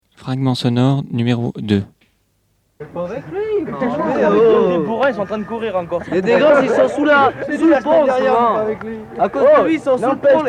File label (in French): proxemie acoustique habitat apres installation bancs